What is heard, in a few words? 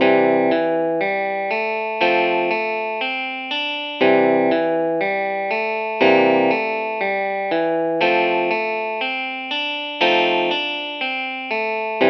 12 bar blues pentatonic notes